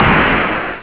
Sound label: smack, drums misc; OctaMED SoundStudio V1